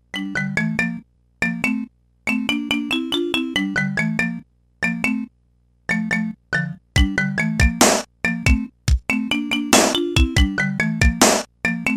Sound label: Far Thunder; Extended